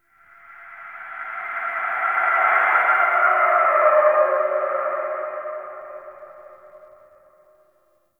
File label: Shriek, Crypt Ambience; One Offs; Champion Crypt